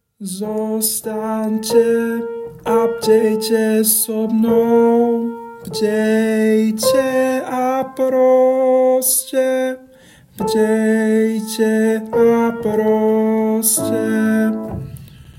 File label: Tenor; Zostante